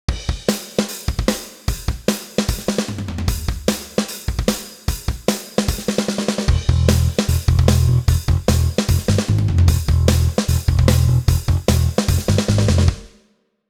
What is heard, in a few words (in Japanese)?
ビフォー, 打ち込みドラム; 打ち込みドラム リアル化